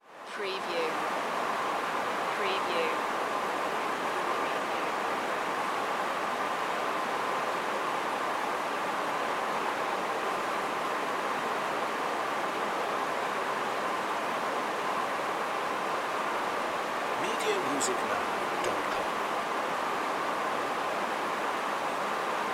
Sports Crowd Large Outdoor Stadium General Crowd Noise